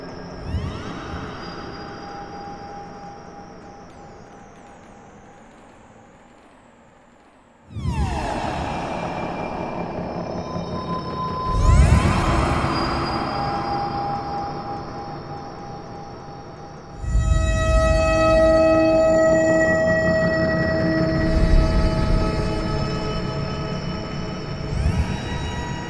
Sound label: Space Wastelands Ambience